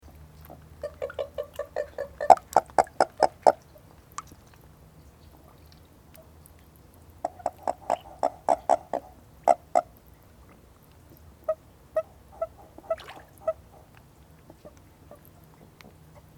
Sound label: Bufo Japonicus formosus Is Calling